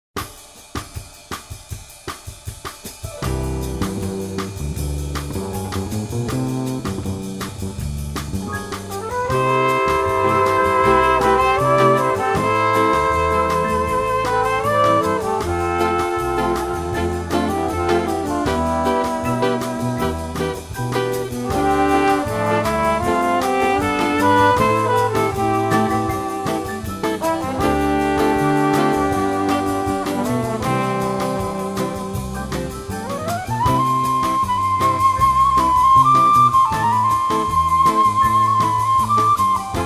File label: Bossa Medley